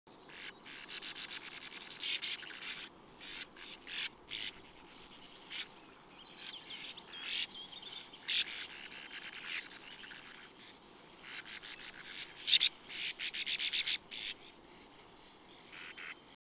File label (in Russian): zvuki beregovoj lastochki